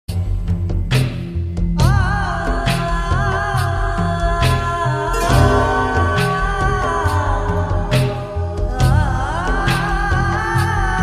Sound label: Cute Tone